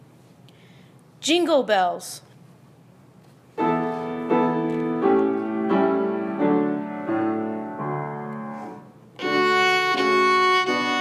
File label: Jingle Bells with Piano